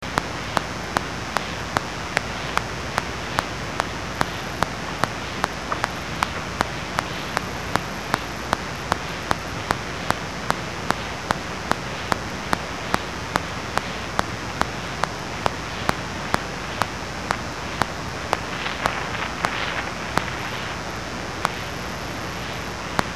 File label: reed switch